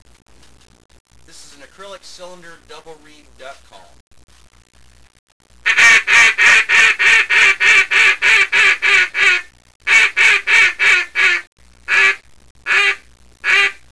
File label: acrylic cylinder duck